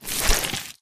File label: human form short move; flood